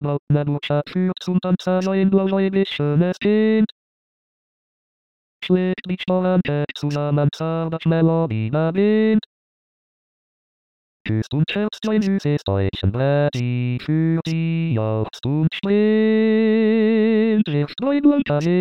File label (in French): basse